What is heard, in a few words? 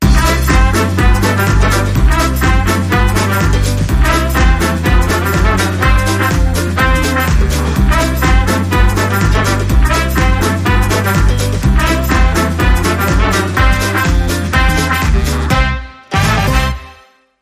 Electric Swing, Jingle